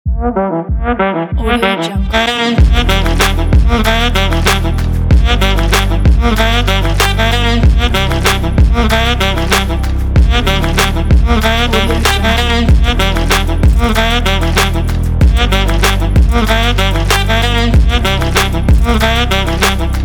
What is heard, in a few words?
fashion upbeat electronica